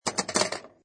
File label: Pinball Sound Ball Hits Three Kickers; Video Games (Sound Effects:Games)